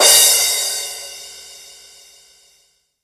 big room crash cymbal c sharp key, Royality free crash cymbal sound sample; big room crash cymbal audio clip c sharp key 12